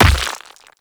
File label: Brawler Rock Elemental Footstep Default; Warframe; Warframes